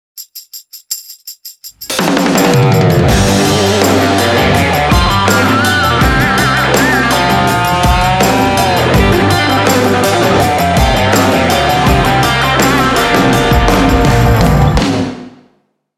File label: COUNTRY ROCK Country Cliche mix VOX UP